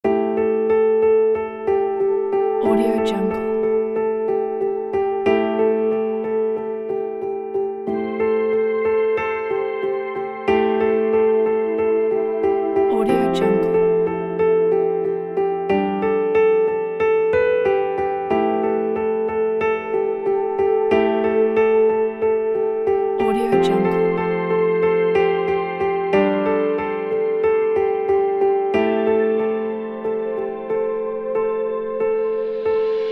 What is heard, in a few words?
soundscape documentary pack